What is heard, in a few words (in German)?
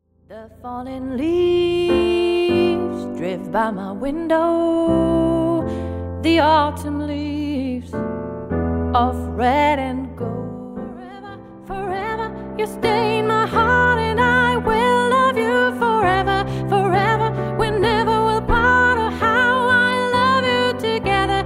Medley Jazzsongs